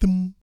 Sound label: BASS THUM; Spectrasonics; Vocal Planet; Jazz FX